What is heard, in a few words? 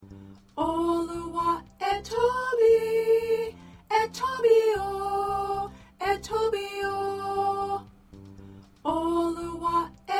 Soprano